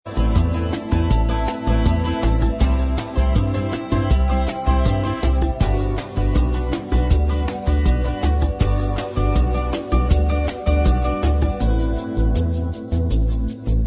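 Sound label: bossa instrumental track, backing tracks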